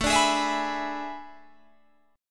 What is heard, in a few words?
A Major 7th Suspended 2nd Suspended 4th, Guitar, Open G